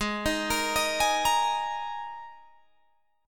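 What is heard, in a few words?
Ab Suspended 2nd chord, Guitar, Open G tuning; down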